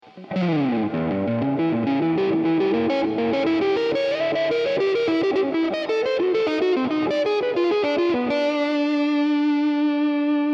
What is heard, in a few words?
Classical